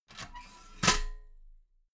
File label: metal ladder small handle fold regular